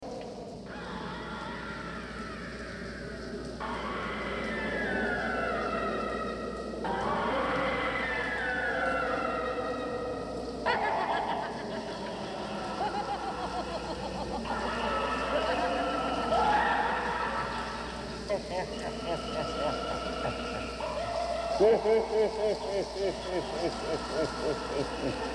dämonisches Gelächter; Horrorgeraeusche

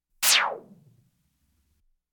weltraummaus; Science Fiction

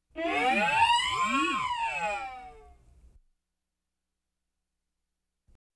Warnsignal; Science Fiction

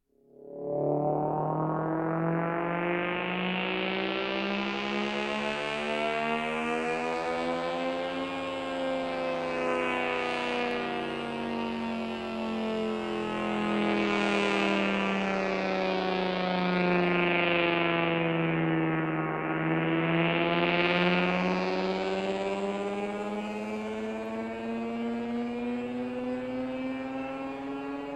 weltraum umlaufbahn; Science Fiction

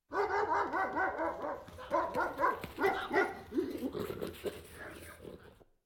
bellender hund verjagt mensch; Auf dem Land

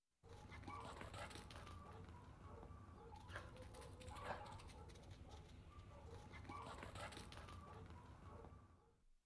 hund rennt hin und her; Auf dem Land

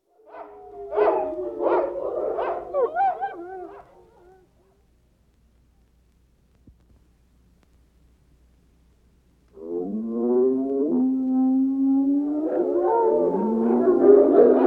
wilde hunde, Tierstimmen

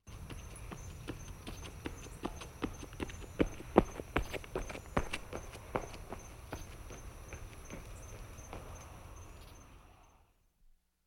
jogger von rechts nach links; Sport

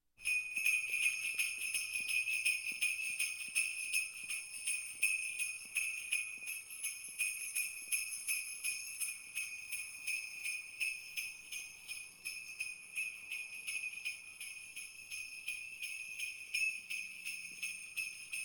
schlittenglöckchen der weihnachtsmann geht; Feste-Feiern

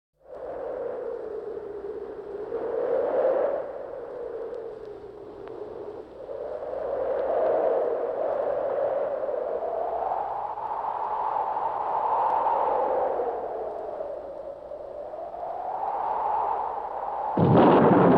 Wind und Donner; Horrorgeraeusche

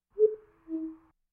kuckuck; Auf dem Land

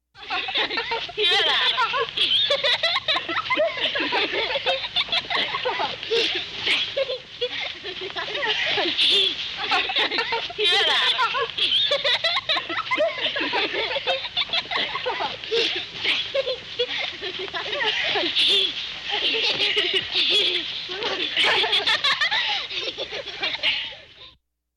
gelächter kleiner kinder; Lachen

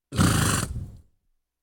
pferd, Tierstimmen

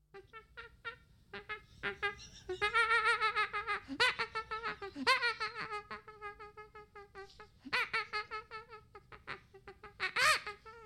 lachender affe

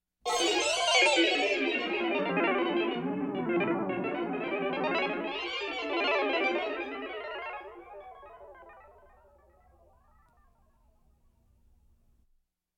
außerirdische insekten zufallstonhöhen, Science Fiction

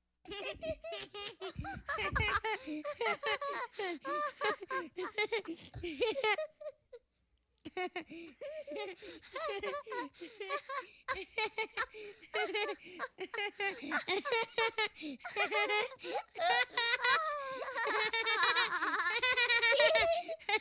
junge & mädchen, Lachen

